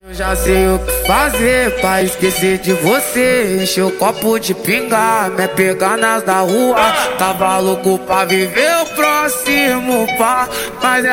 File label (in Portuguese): funk ritmo